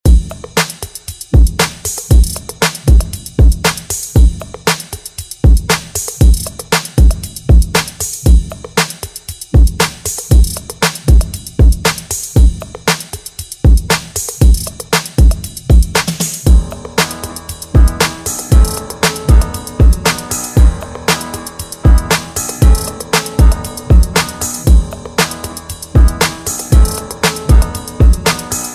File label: 60s Ext Redrum